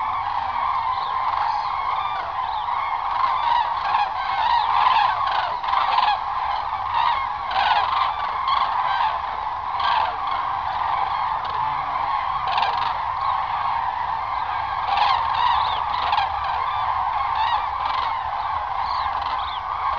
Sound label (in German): kranich